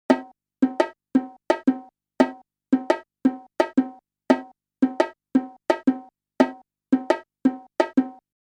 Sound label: 4 repetitions of the previously unpracticed rhythm 4:3